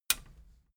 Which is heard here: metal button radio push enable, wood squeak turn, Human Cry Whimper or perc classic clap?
metal button radio push enable